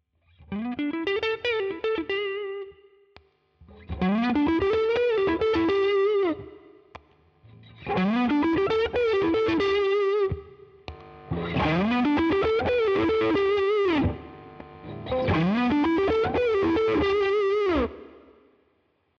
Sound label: Strat FAT; 12 Uhr Gain; 12 Uhr Tone